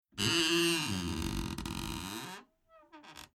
Household Old House Large Door Hinge Squeak Horror Eerie; High Pitched Long; Creaky Door; Tom Hutchings